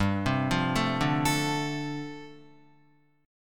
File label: G 9th Suspended 4th, Guitar; G9sus4 arpeggiated